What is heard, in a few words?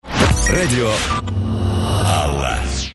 Radio; Radio Alla